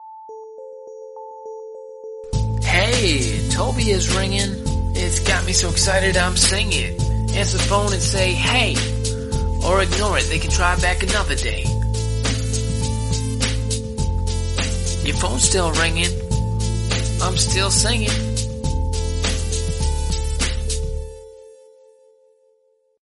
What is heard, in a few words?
rap